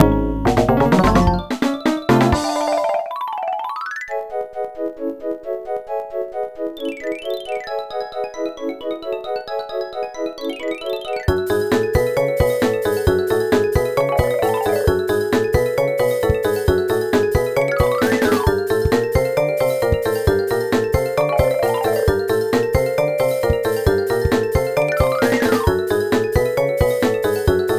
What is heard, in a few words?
Bonus Level; Tingle's Balloon Fight; nintendo ds